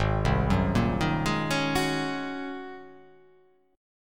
G sharp 11th G sharp, down; arpeggiated; JGuitar

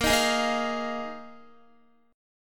A sharp 7th Suspended 4th A sharp, Guitar; A#7sus4; strummed; x,13,15,13,15,13

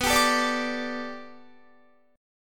B-Minor 7th Sharp 5th B down Guitar; Bm7#5 strummed

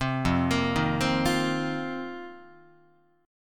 F 7th Suspended 4th F down Guitar; arpeggiated

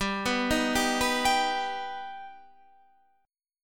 G Augmented G Guitar Standard; G+ arpeggiated; 15,14,13,12,12,15; JGuitar

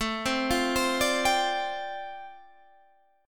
G 7th Suspended 2nd Suspended 4th A

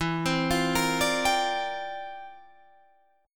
C 11th E

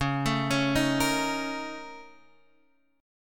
Bb Minor Major 11th Db

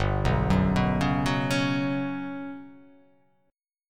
G Major 7th Flat 5th

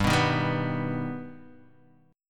G Augmented G Guitar Half Step Down

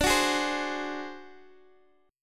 D 7th Flat 5th D down Guitar Open D

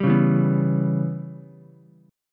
C sharp Minor 7th Flat 5th, Ukulele; strummed; JGuitar

